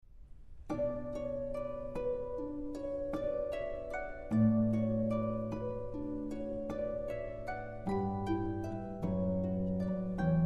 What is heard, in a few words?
harp fusion